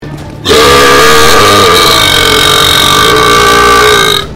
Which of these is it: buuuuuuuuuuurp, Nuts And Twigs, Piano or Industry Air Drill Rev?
buuuuuuuuuuurp